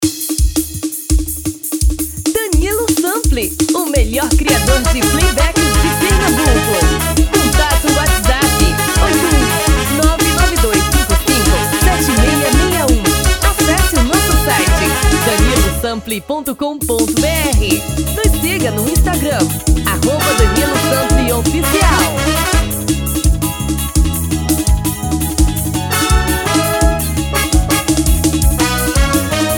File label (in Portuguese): Frevo tom feminino